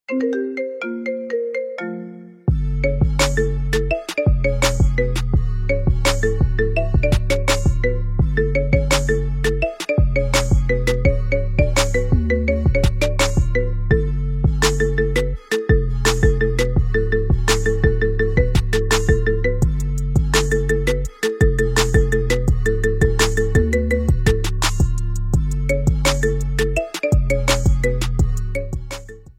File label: Marimba